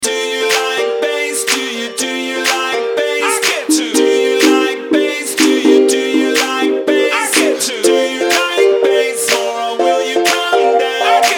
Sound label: do you like bass